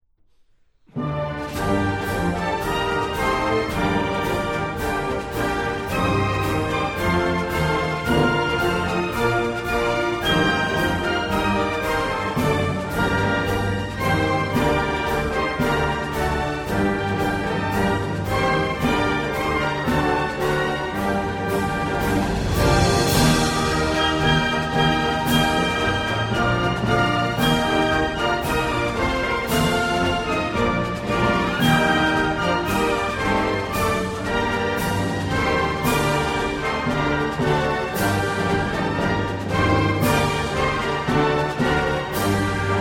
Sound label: Imnul Romaniei, instrumental; Desteapta te Romane